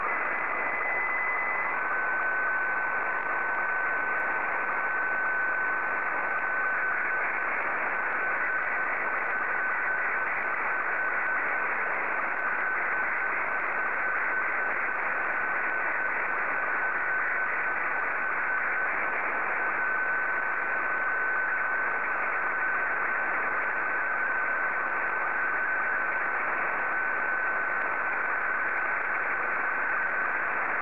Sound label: sstv